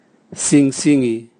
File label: gong tamtam